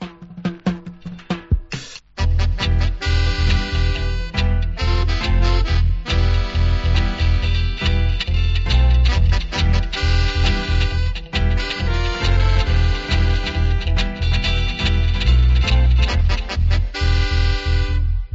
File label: Roots Dub